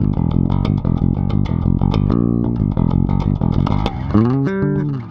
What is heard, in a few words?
Rock, Bass; Bass X